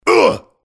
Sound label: mobster pain sharp